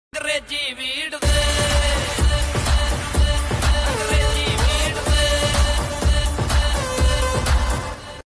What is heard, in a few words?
lofi hindi ringtone